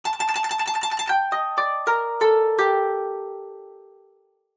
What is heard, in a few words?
Koto